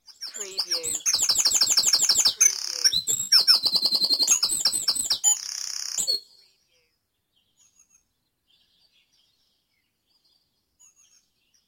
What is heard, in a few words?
Dawn Chorus in Mold North Wales